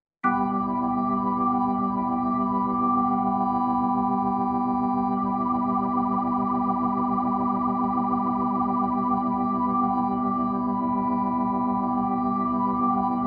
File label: Organ Rotary; NumaOrgan2